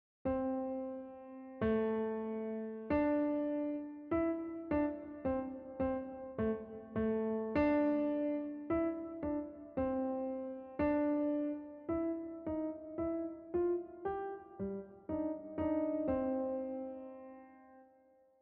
JazzWalk

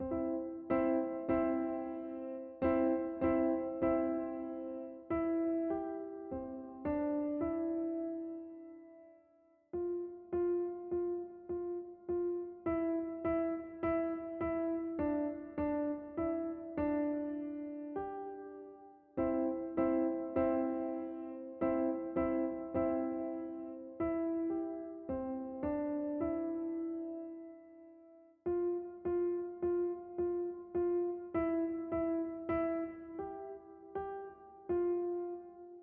Jingle Bells

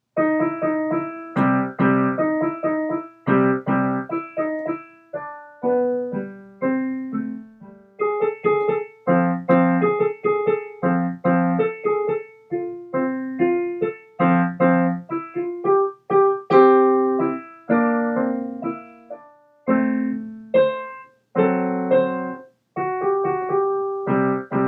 Home Recorded

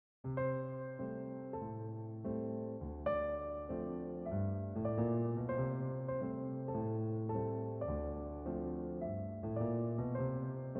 Jazz Walk

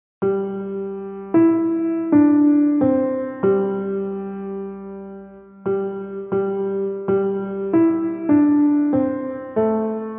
Jingle Bells

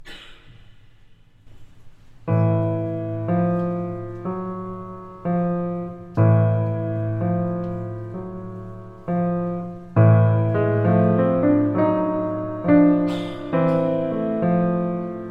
Clarinet Blues, student recordings; Mier

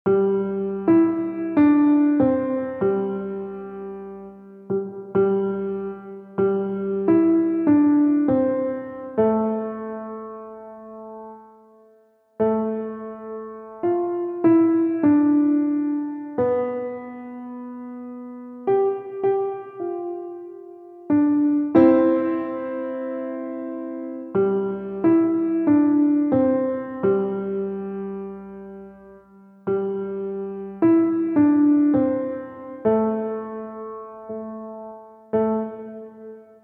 Jingle Bells

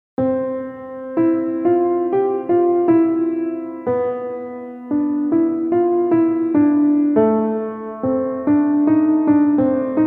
Cool Breeze Waltz

